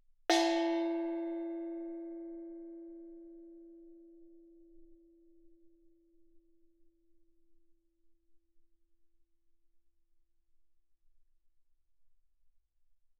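thai gong E4 fortissimo, Percussion gongs & tamtams; thaigongs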